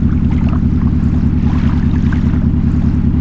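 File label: SUBMARINE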